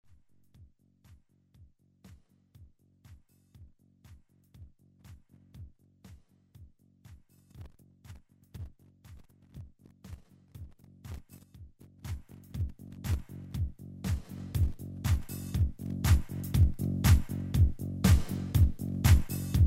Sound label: Instrumental